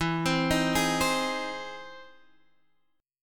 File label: E Augmented Major 7th E